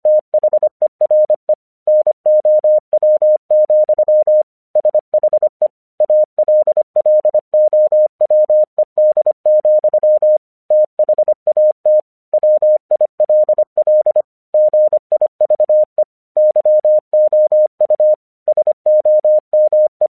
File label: English 25wpm